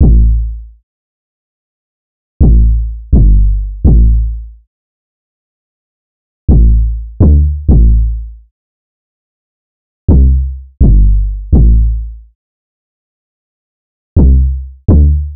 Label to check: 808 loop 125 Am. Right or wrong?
Right